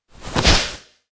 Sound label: teleport